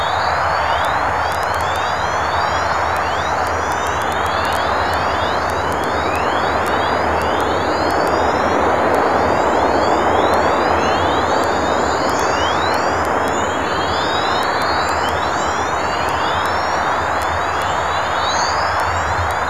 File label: chorus cluster; gurnett